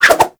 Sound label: Dagger Spin Whoosh; Warframe